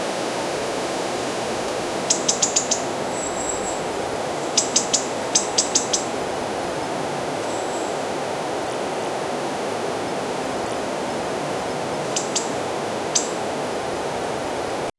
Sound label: a gentle slow wind, forest